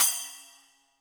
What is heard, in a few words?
HiHat & Crash, Roland TD-20; HiHats